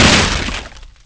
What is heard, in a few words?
wood destroyed; sfx